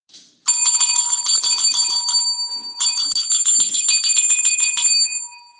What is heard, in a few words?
bells ringing